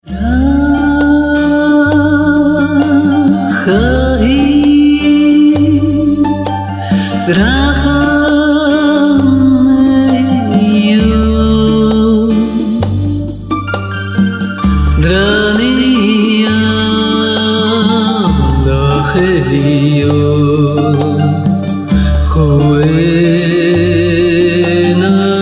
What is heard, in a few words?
Arabic